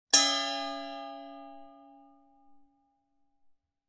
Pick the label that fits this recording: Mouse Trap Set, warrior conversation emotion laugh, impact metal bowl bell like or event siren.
impact metal bowl bell like